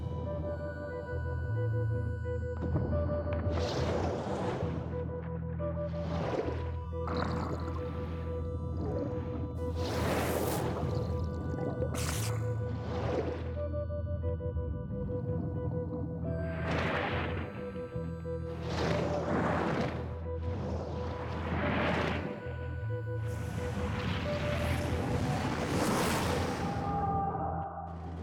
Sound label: Credits A (louder background synth); mcsm music; Netflix